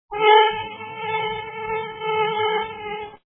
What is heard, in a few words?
fly